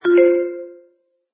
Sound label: notifier shake; INOI